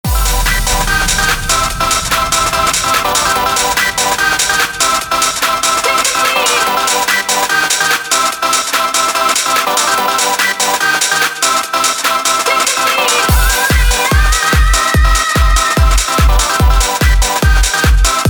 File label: piano mix